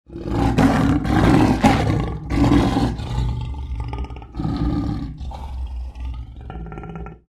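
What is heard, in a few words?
leopard voice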